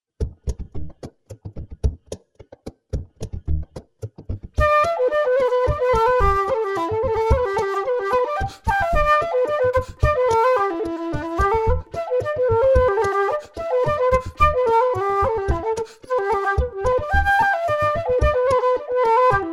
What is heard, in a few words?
flute percus; birdinthebush